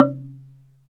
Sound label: Slit Drums, Africa, Wood & Metal